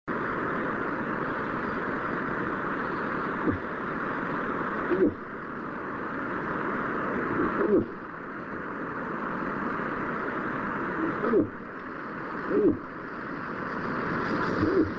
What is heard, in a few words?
moose